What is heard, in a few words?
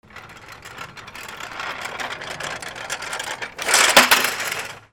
TOYS